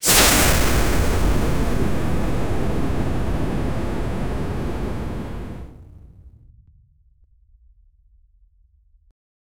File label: explosion sound; make an explosion sound; nuclear